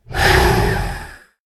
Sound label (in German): schaden; Barotraumatic; Shrimpbrut